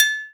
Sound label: AGO GO BELL, Brazil; AKAI S6000 CD-ROM - Volume 5